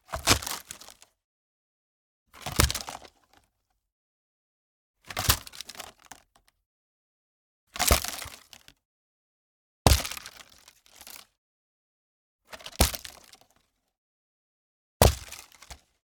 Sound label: Flexible Sewer Pipe Big - Broken - DROP - Thump; Pole Position; The Plastic Textures Library